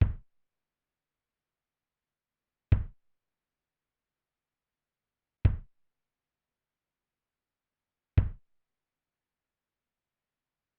kick main

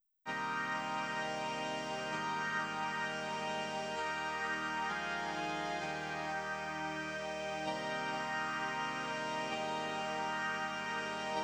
texture pad solo

